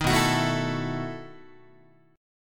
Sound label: B 11th Guitar; strummed; JGuitar